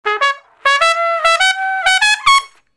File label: Trumpet Solo